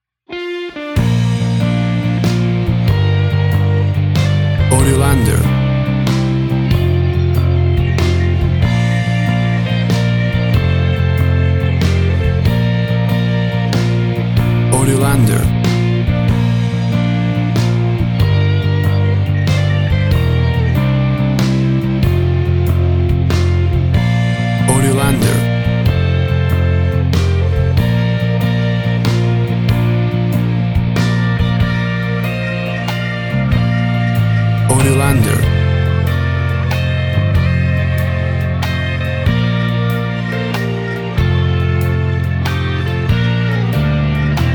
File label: USA National Anthem Full